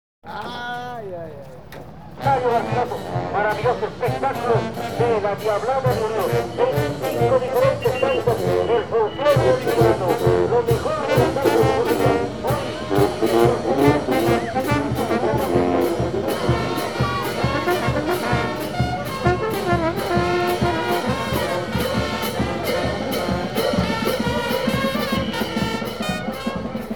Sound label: brass band